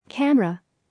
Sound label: camera